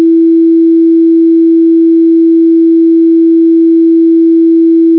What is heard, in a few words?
tuner high e